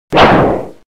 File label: Roblox Sword Lunge